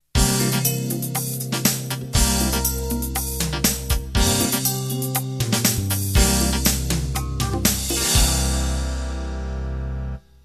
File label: Acid Jazz End